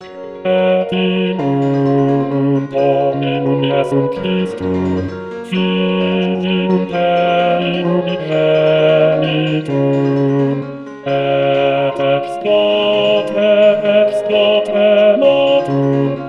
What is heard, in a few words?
credo bass 415